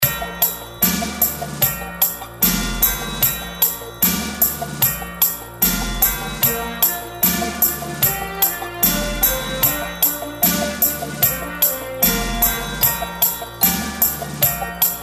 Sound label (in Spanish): fondo; hangman